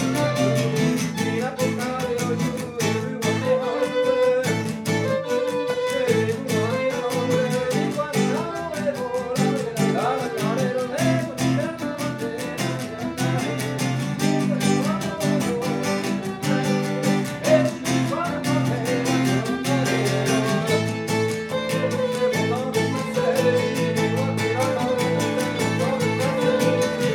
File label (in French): plinn rythme